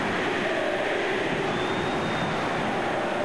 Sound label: CHANT; swos-soundset